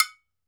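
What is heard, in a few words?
TOKE BELL, African Perc; E-MU Producer Series Vol. 7 – Old World Instruments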